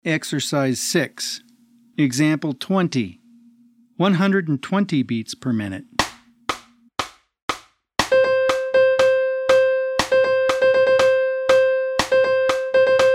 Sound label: 120 BPM